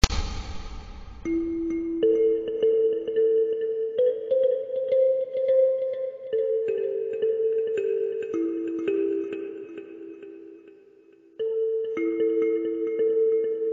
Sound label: Kalimba With Delay Echo